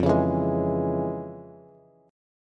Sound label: F Minor Major 7th Sharp 5th, Bass; FmM7#5 strummed; 13,16,14,13